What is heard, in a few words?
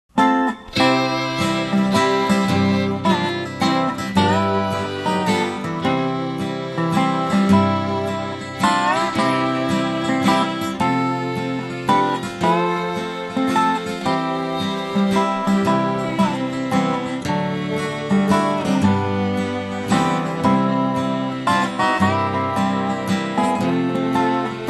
old time gospel instrumentals